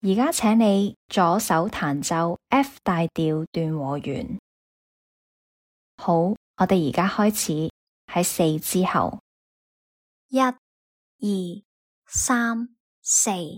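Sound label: f major broken chord lh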